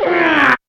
hookbill

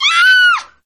Death; Fio

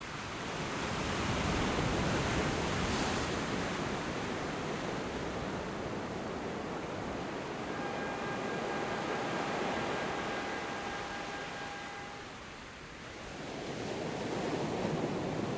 english seaside night; Lbp

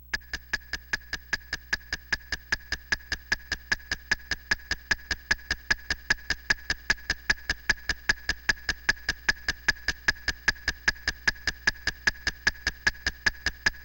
CLOCK BOMB COUNT

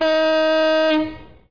LORRY HORN; Frogger 1997

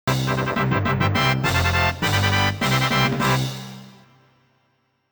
Unused Fanfare

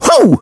Grunt; Mario

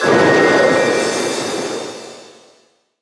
spell throw; HPSS PC

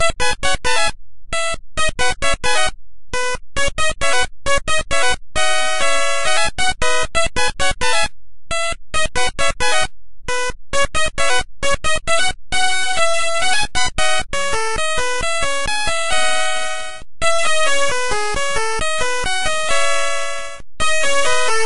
Unused Song Restored; Pokemon Red Blue Yellow

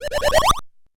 jump; SMM2

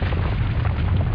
ROCK ROLL; Frogger 1997

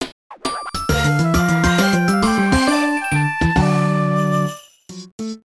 level complete; DTL Proto4

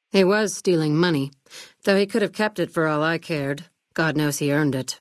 laughter; FNV; vangraf

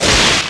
crackle; Carmen Time 1997 Demo; TUNNEL

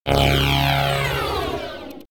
Game Over Fall; Tetris DS

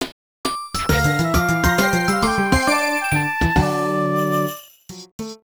level complete snow

